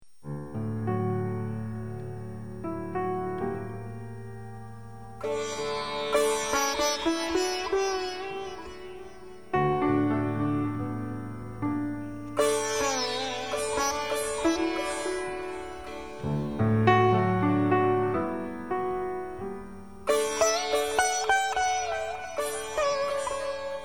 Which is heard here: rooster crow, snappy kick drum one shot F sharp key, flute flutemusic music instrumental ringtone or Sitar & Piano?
Sitar & Piano